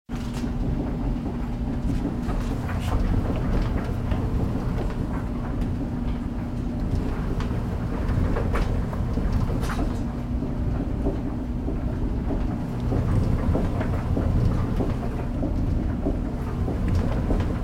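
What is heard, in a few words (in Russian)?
звук стиральной машины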